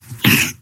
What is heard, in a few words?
efforts